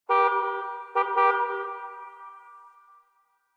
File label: Distant Car Horn; Warframe; Ambience; Nineteen Ninety Nine